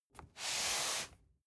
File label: cardboard box medium slide short soft; objekte